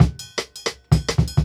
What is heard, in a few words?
Jungle Breaks